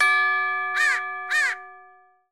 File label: stunned